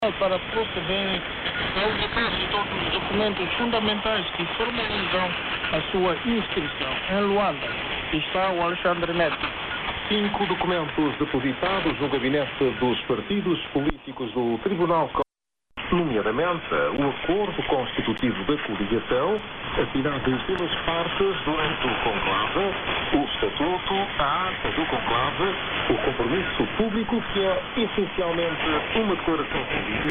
13630khz AM 7khz; Radio Madagascar

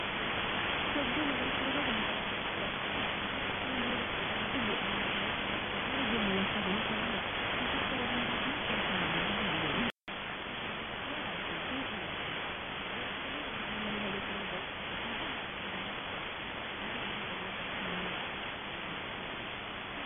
13695khz All India Radio; HDLA Loop12

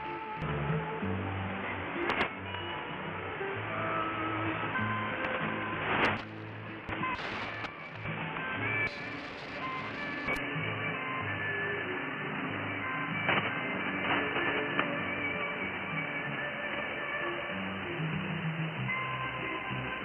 5910khz AMS; Alcaravan Radio

